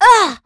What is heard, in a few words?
Vox Damage; Estelle; kingsraid; voices heroes